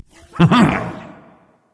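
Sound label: "heh heh", nonverbal; smite; voicepack; khepri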